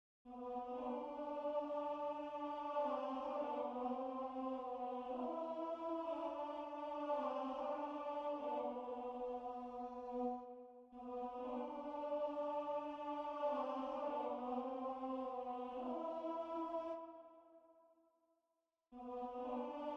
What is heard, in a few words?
Siyahamba Tenor